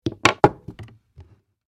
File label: Small wooden box container open